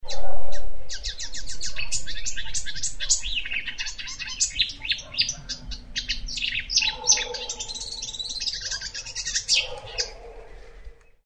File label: marsh warbler bialowieza